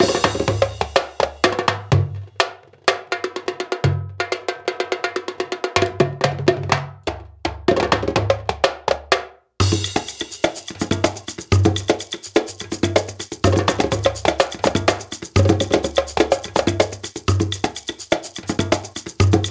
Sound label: Afro rhythms; African Rhythms; Tribal House Afro Latin House Percussion; Loopmasters